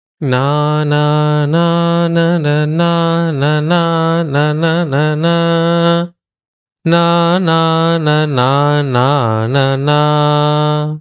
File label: Shardula vikridita tune